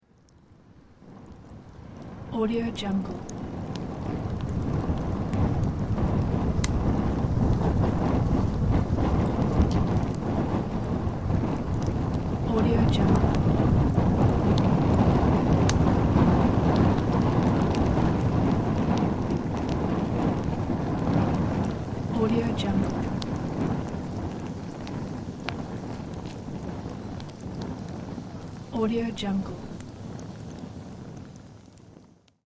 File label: fire noise